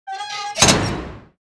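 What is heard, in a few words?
metal bar door close; The Sum of All Fears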